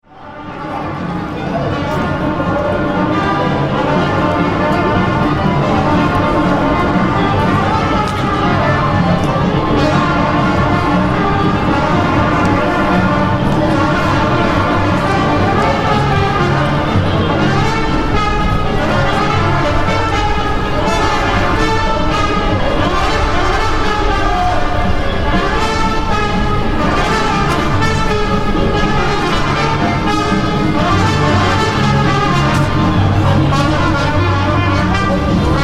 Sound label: Lively brass street band in city centre; Concerts; Backgrounds